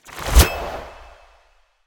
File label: Ice Spell Utility Unlocking; Arctic Aura